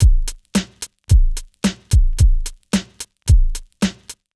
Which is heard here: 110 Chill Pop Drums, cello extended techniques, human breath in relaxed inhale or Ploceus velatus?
110 Chill Pop Drums